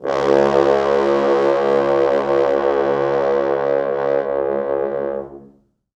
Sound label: 4 FrHorns